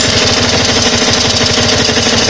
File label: digger grinder loop, vehicles; Source Engine; video game